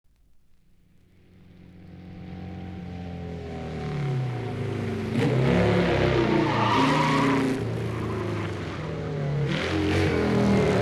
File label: Race Car Pit Area